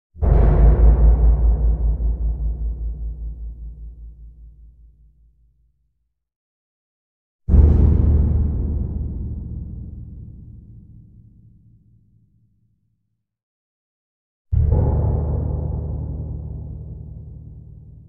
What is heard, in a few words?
Distant Cell Door Slams Shut, Resonates x3; Hollywood Edge Sound Designers Tool Kit